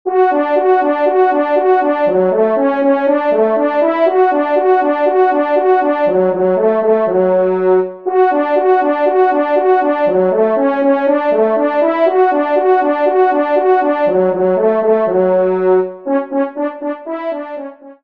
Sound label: Trio Allegro